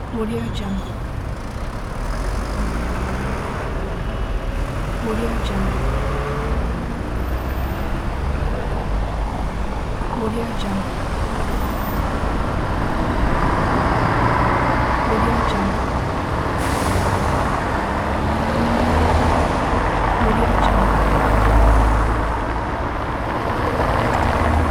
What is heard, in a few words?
traffic